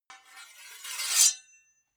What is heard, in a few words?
sword sabre metal scrape zing